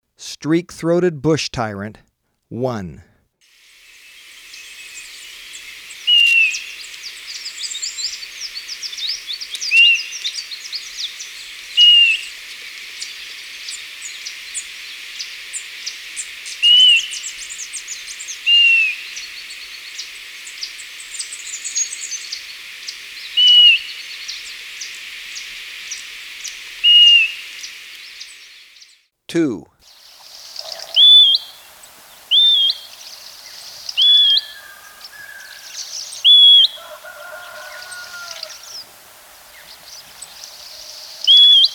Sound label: Streak-Throated Bush-Tyrant, Birds of Ecuador; S. America